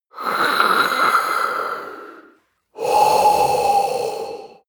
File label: breath male hiss